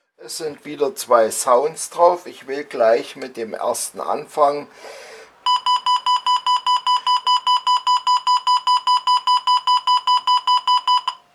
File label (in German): Wecker Signal Fast eine Klingel